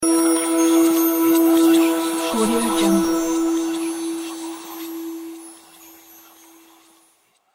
magic spell effect with chimes and voices

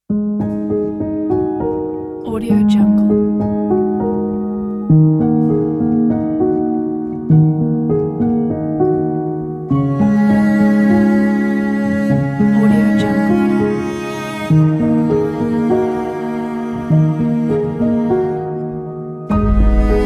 sad piano quiet resolve

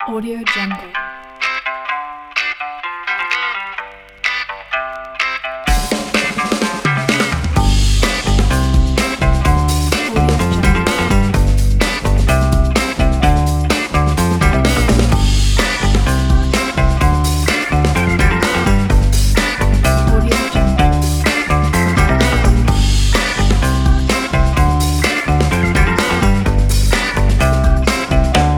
advertising funk

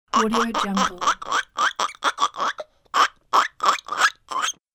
cartoon baby monster hiccup

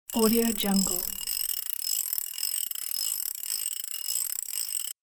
metal rattling mechanism

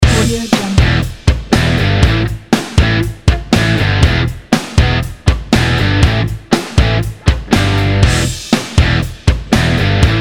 rock loop; duktovsky

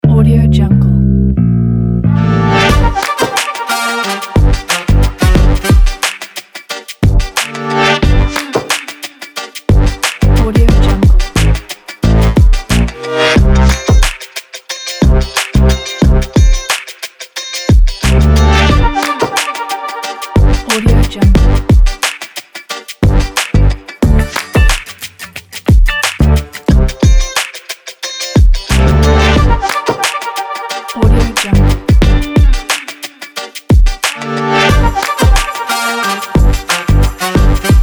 saturday vibes funky blues

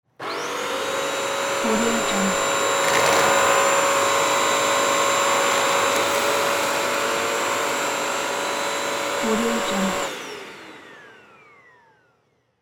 vacuum cleaner

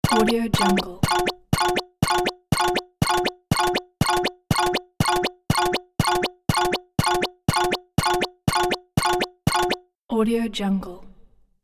animated cartoon machine

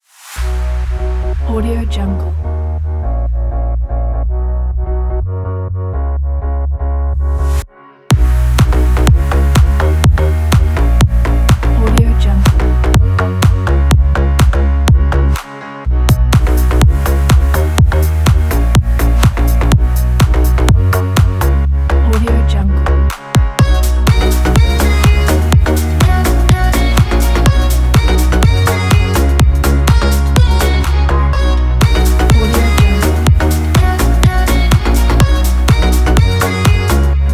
dance promo club

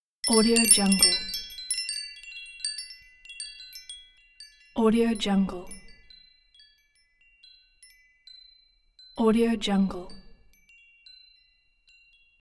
shop door bell; kuksaudio

